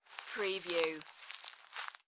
Velcro Press Movement

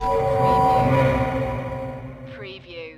Hi-tech Panel Servo Button

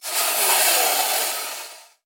Sand Pour From Metal; sound effects bible

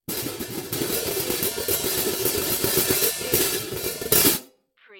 Cartoon Drum High Hat Open And Close Multiple

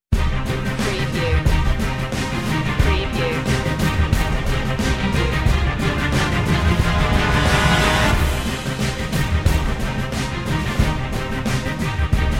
no choir

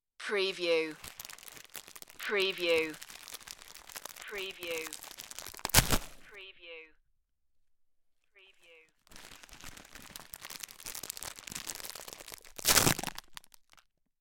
package rip open

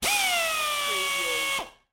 Pneumatic Drill

